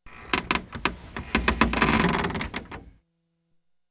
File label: Bed Squeak More Ratt; bed movement